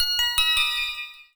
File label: startup; allwinner; v851s-fastboot sl100 back; busybox-init-base-files